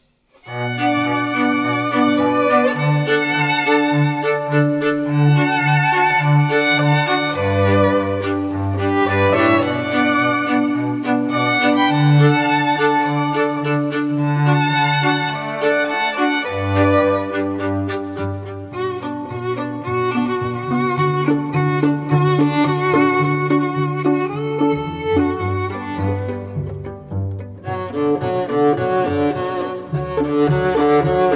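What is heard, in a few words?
strings, String Quartet, Trio or Duo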